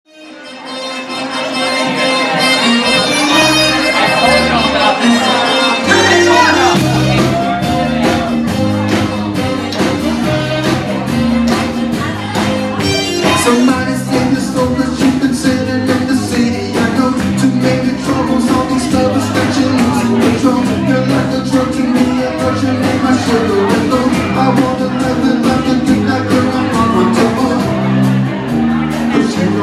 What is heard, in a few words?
Hand Clap